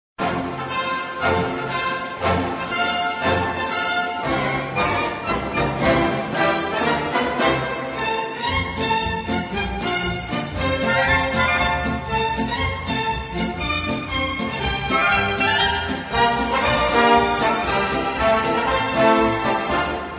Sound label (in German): Marsch